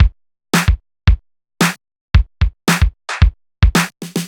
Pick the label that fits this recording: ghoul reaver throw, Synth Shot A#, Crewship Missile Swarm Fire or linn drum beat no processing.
linn drum beat no processing